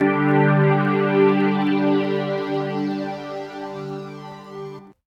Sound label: Polysix 4th Vinyl Synths G2; vinyl chords